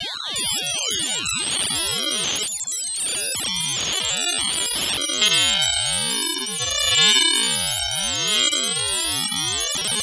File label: mad radio, special FX twisted